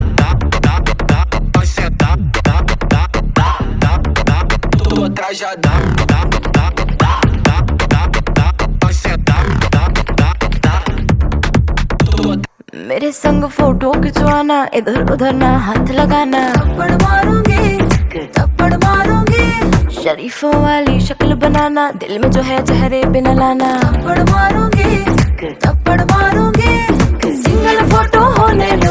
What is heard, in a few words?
Ringtone; pushpa 2